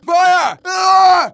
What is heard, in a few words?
fire, player; Team Fortress 2